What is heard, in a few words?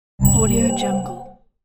notification sound